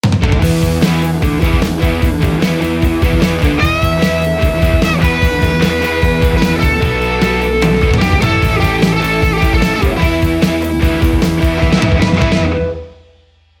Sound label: crunch modern; aradaz